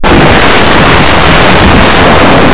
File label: drawer